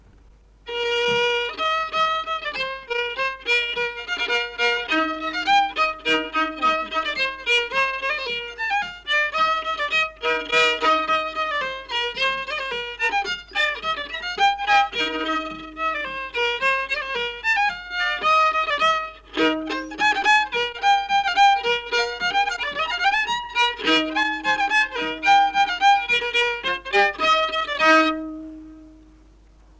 Pruudi polka viiul folk; Ruhnu

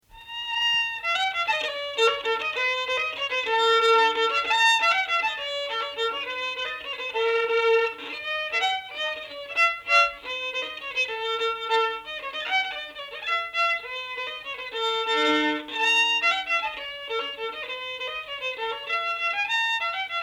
Ruhnu viiul folk; Kockleiken 1; Kokalugu 1

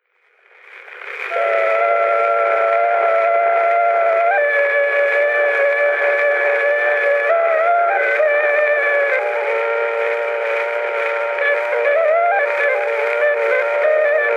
Torupilli Jussi lugu, Emmaste, torupill, folk

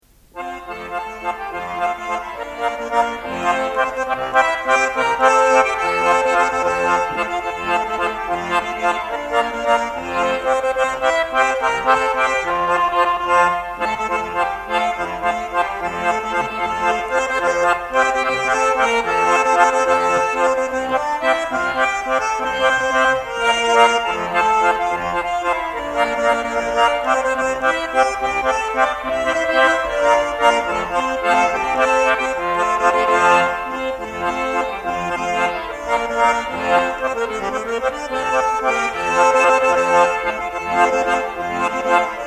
loots folk; Kanepi; Ma tean Kallike kaugel

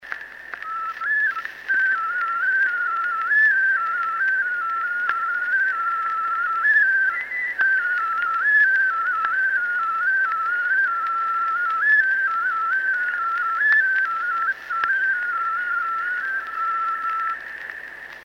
Pillilugu, vilepill folk; Kuusalu; Mart Masurin

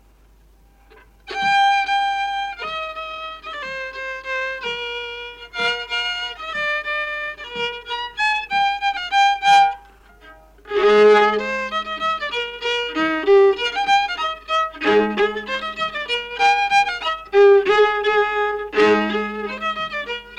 Hambo Ruhnu viiul folk

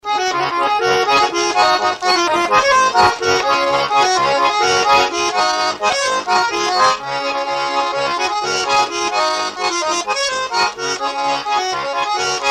Polka, loots, folk; Polva

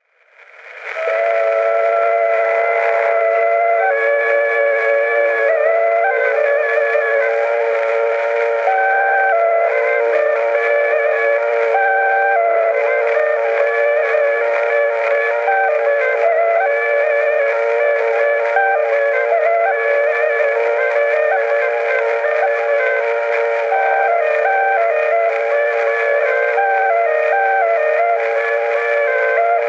Torupilli Jussi lugu, Emmaste, torupill, folk

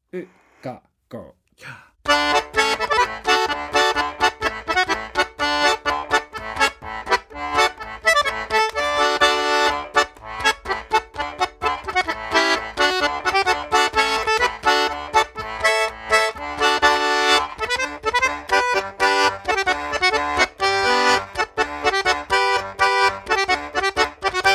Vanapapa polka loots folk; Juhan Uppin

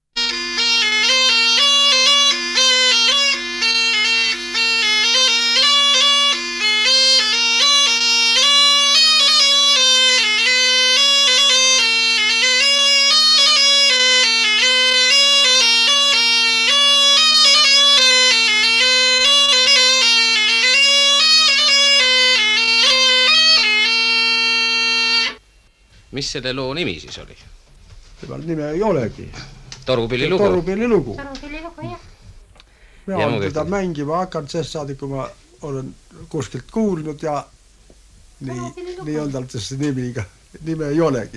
Torupillilugu, torupill folk; Karksi; Peeter Sild

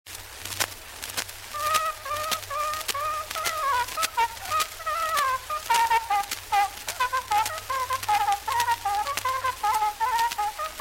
Venetukk Suure Jaani sikusarv folk; Jaan Parbo

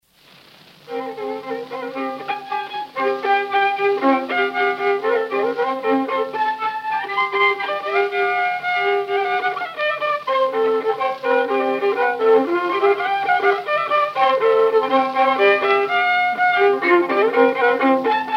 Paistu viiul folk; Riia ahtsam

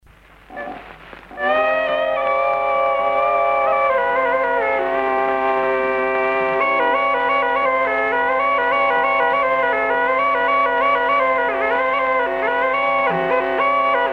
Labajalavalts torupill folk; Kuusalu

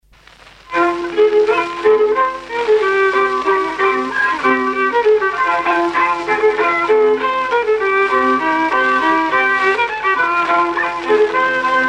viiul; Kiiguri-Kaaguri; Kuusalu

